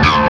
HARMONC DRAG, Guitar; East West; Steve Stevens Guitar Samples Collection

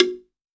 LOG DR, LOG DRUM, Africa

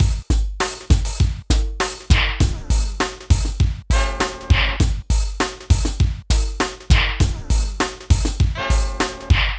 PHATT BEA, Hip Hop

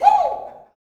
"WOO", Gospel, YELL SHOUT; ILIO; Vocal Planet

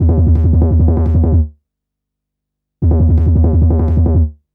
Psycho Bass; Fluid Dynamics

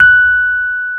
F#5, Electric Pianos; Wizoo

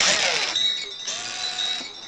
WIND UP TOY, rhythm loops; Rarefaction; Poke in The Ear With A Sharp Stick

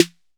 SNAP SNARE; RAP CITY 1

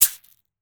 Household Shaker, household rhythm samples Hits

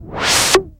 Back Stop; Jungle Warfare 1&2

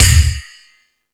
Thundadome, E-MU Pro-Cussion

